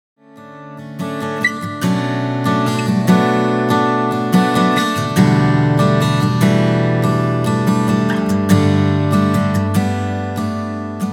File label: ak groove